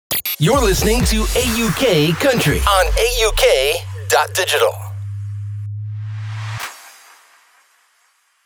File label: country